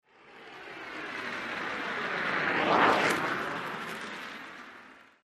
Blow Torch By At Medium Speed, With Lots Of Air Ripping